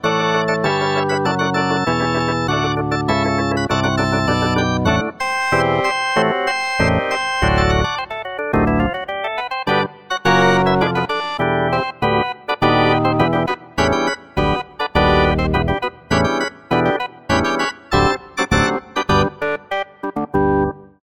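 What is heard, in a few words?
electric organ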